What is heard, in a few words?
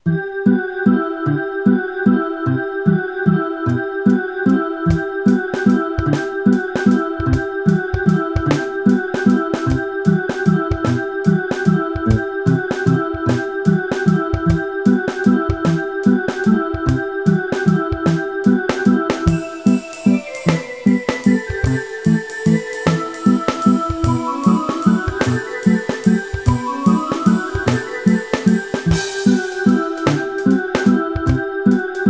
carol of the bells